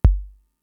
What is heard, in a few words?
Kick, Drum Machines Fricke MFB-522